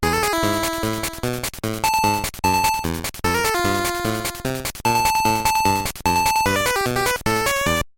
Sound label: Level Complete; Fire Fighter; GB